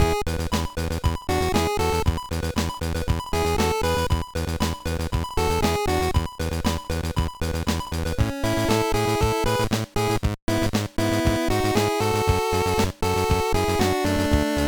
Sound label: Battle Menu; bomberman tournament gba; Charabom